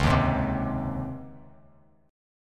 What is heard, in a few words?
Db Major 7th Suspended 2nd Db Bass Standard; DbM7sus2 strummed; JGuitar